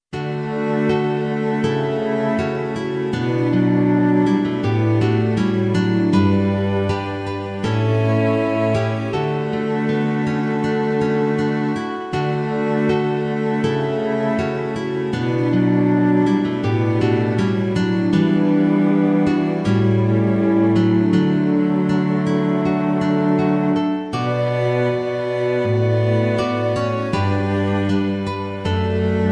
Like a deer, Fl Str Str Gtr

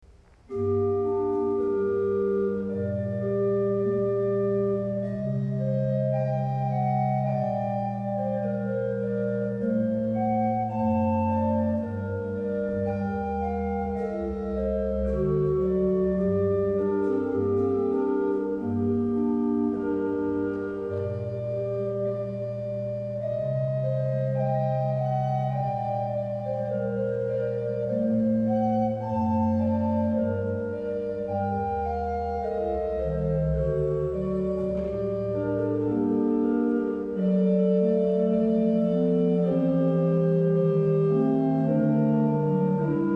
Organ; God Lamb

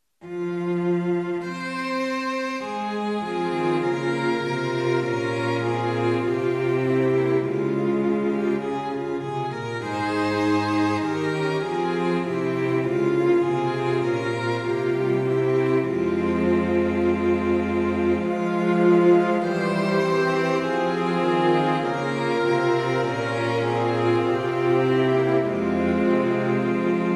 Another Harmony, Str Fl Fl Fl Fg

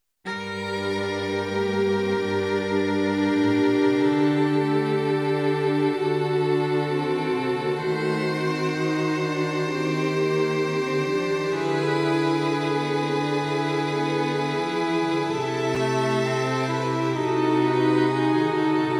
Midi Great Light Fl Ob Eh Fg VC QN 64

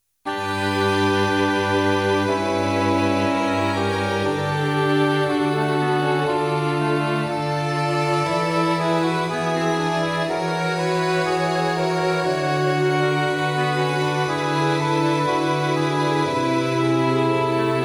Midi Court Fl Ob Eh Fg VC QN 60

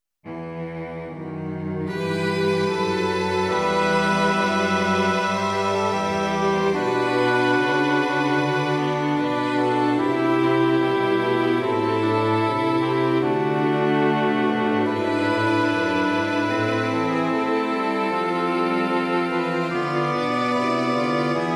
Midi Journey To Bethlehem Ob Ob Eh Fg VC QN 74

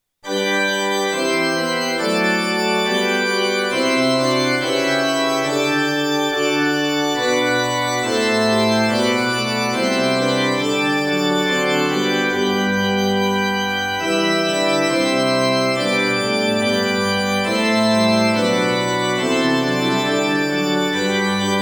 Choral alternative harmony, Organ; BWV147; Tonality：G (♯) Tempo：Quarter note = 70

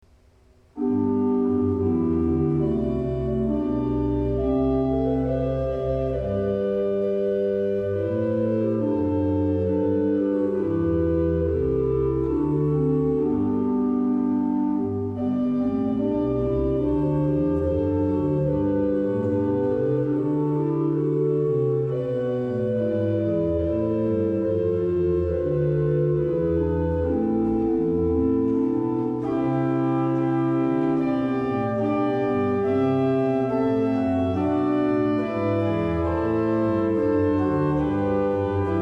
Organ; Look Up At The Stars Again

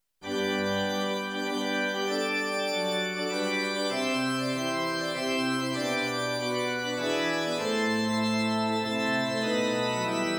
Organ; Hosana The Son Of David

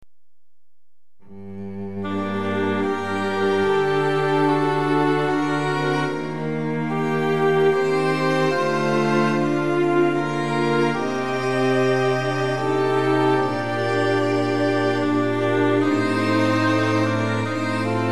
Carol, Fl Ob Fl Fl VC, Midi

